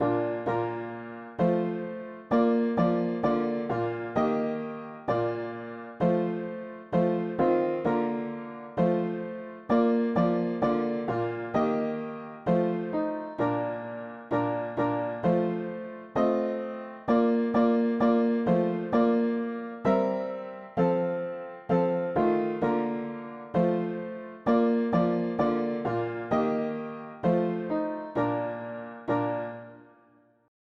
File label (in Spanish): mono piano; hymns; Himnario pequeno